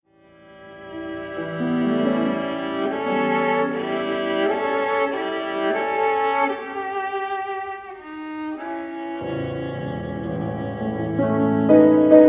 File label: viola sonata